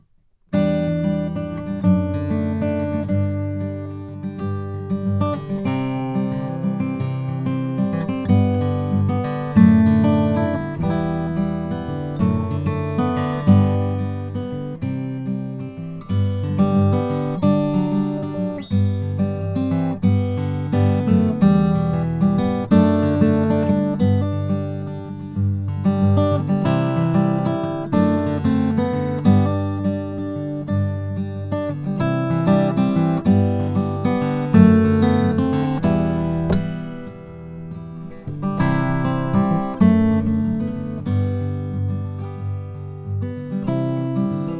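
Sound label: mossman flint hills indian rosewood, Guitars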